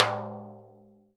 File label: TIMBALE LO RIM; Sonic Reality; Interactive Drum Kits